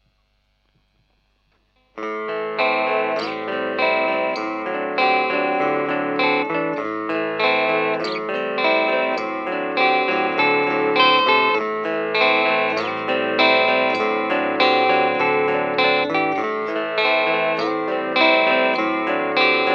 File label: both Pickup clean; Cort